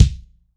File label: KICK, MG KICK